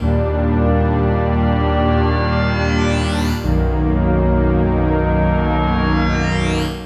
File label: Pad, Synthesizers